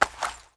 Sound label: g18 grab mag, weapons; svencoop